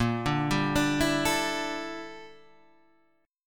G Minor 6th Add 9th Bb

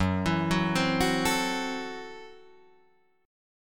Gb Minor 7th Flat 5th, Guitar Drop D

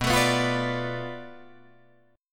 B Minor 7th Flat 5th B Guitar Drop D

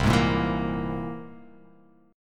C Augmented 7th, chord; strummed; x,3,6,3,4,5,x,x; JGuitar

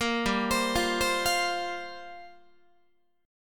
G Minor 11th G down Guitar; Gm11 arpeggiated; 13,15,15,15,16,16

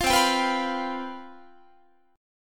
C Major 7th Suspended 4th, C, down, Guitar; CM7sus4 strummed

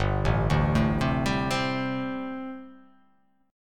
G Suspended 2nd Suspended 4th; arpeggiated; 3,0,0,2,0,2,2,x

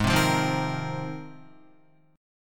Ab 6th Add 9th, Guitar; strummed; JGuitar

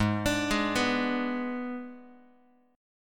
G sharp Diminished G sharp, down, Guitar; arpeggiated; x,4,5,6,3,x; 4A,4E,5A,5D,5G#,6C#

